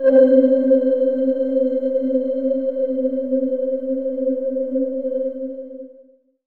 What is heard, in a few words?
amb c, Bell Atmos, Chillout; Best Service ProSamples vol.36